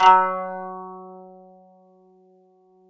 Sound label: KANUN F#; SoundBytes; Early Patches; KANUN SMAL